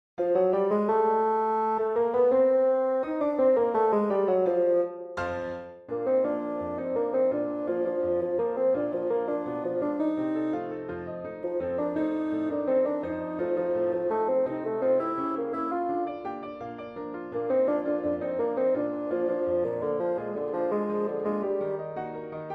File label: The Ragtime Dance Intro Theme A, woodwinds; bassoon solo; double reeds